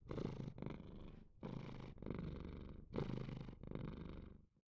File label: Cat Purr; Wearables